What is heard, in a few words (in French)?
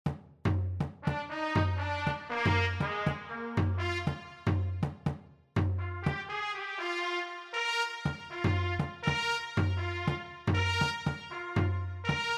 rue du bruit trompette